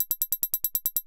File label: Mute Triangle